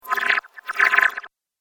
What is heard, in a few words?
Water - Backward Bubbles; Cartoon Sound Design Sound Effects